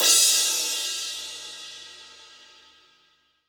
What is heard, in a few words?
CYM CRA; 90 s Sample CDs; Sound & Vision - Gigapack I CD 1 (Roland); Real-Kit s11